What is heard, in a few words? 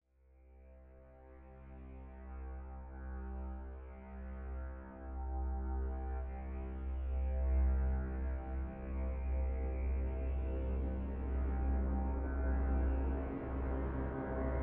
Drone Dark Low, Horror Drone Dark Low; Horror Drone Dark Low Creep; Scary Tones; Blastwave; Sound Effects:Horror